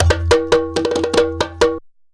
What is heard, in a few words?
sabar groove; Best Service; World Colours